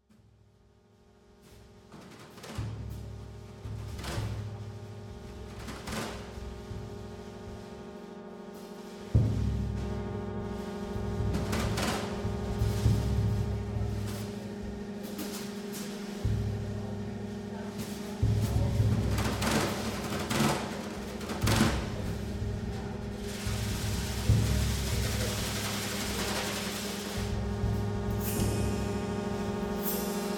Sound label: Organ and Drums; Maastricht